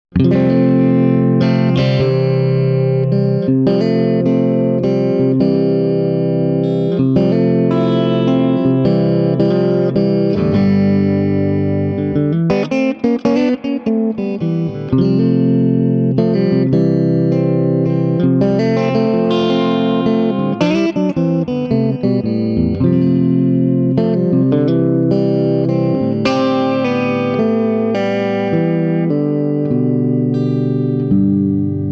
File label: Swart Amp